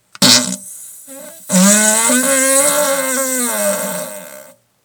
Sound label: Toilet Fart